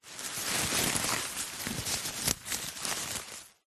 foley paper thin stuffing crumpled handling rustling; zapsplat